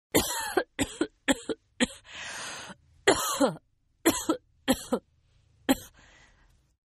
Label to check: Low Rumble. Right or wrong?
Wrong